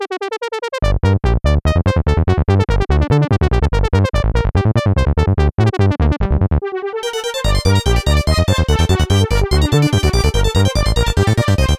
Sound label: Credits Music; Earwax; Jackbox Games; The Jackbox Party Pack 2